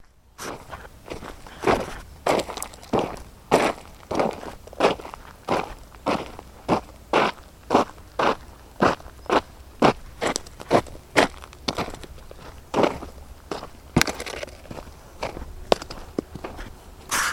Steps

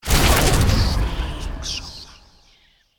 Ancestor Curse Spell

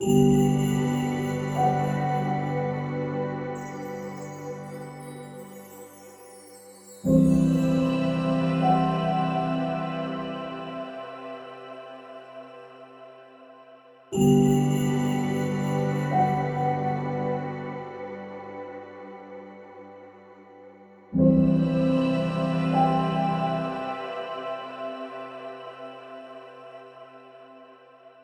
Yoga Loop

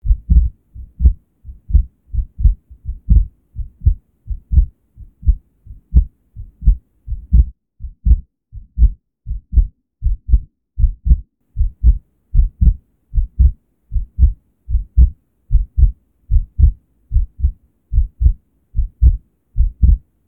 Heartbeat

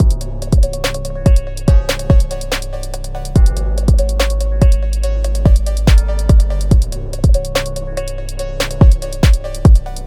Memphis Phonk

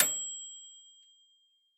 Microwave Ding

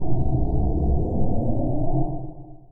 Propulsion

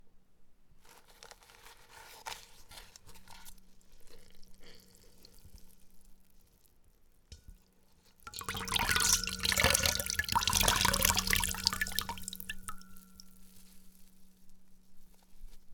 Water Squeezing paper towel Metal bowl

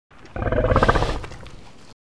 Dinosaur

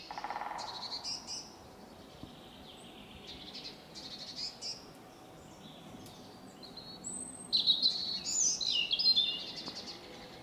Forest Birds Woodpecker

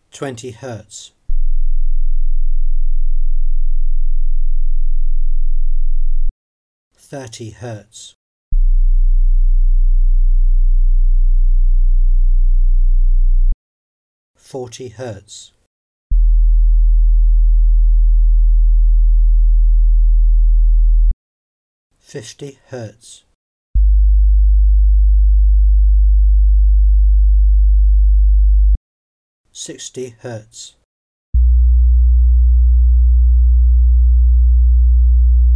Test tones

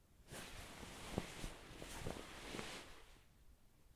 Sheets Sitting Up

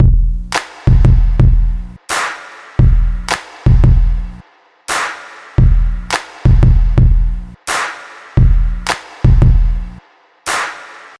Fat Beat

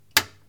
Button

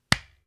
Face Slap

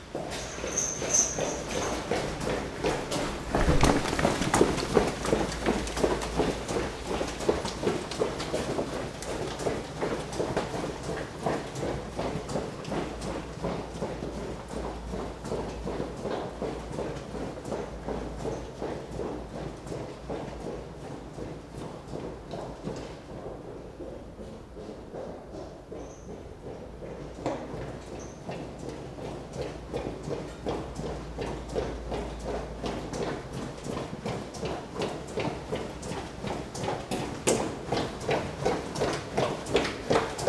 Man Runs Long Corridor

